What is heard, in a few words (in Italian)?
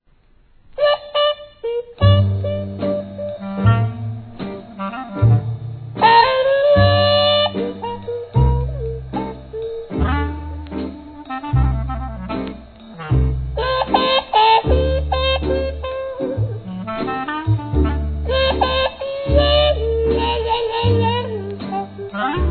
Orchestra, blues